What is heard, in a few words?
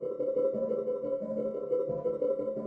noisebell